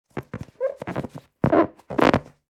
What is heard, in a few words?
Inflatable friction rubber surface squeak